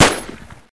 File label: TEC9